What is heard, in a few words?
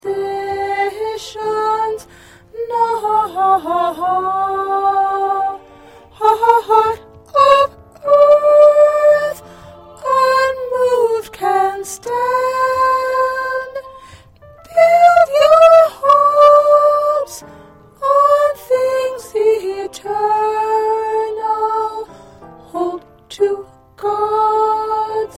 Soprano, choir parts